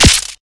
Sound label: cast, Magic